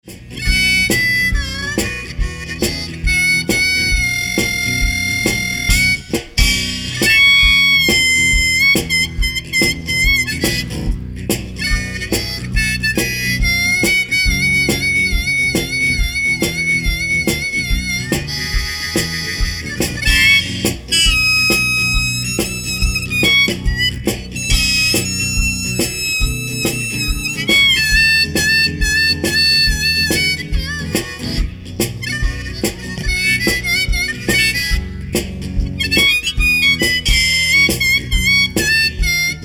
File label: one man band blues